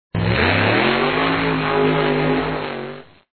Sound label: turbo